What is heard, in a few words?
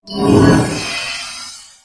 spawn